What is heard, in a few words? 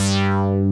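BIG BOTTOM BASS; Pro-Rec - Synthsonic; TRINITY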